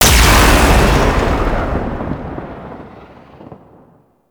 Drop Beam Fire; Enemies; Weapons; Warframe; Tau; Sentient Dactolyst

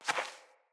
Fawn Footstep; Foley

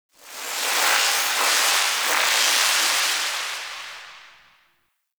Power Rift Buff; Gameplay; Warframe; Zariman

